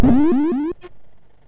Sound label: Die Alien Slime, MOS6581R2